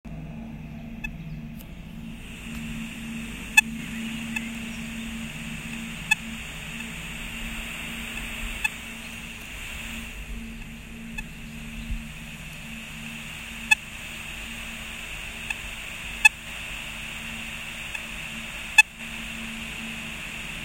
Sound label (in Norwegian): Radar cruiseskip